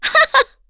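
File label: Vox Laugh, Korean; FreyB; kingsraid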